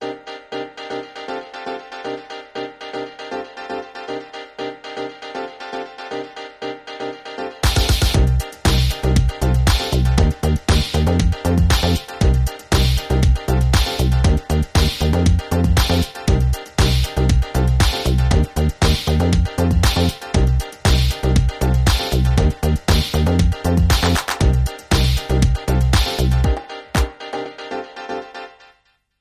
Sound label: Piano